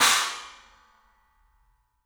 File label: CYMBALS; 3D Sound; 3D Drums